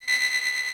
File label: Beep Comby; Warframe; Corpus